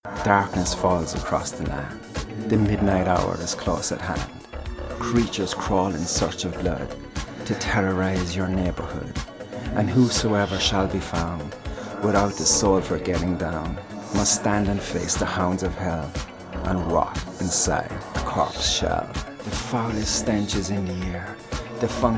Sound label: Ringtone; Gaelforce Audios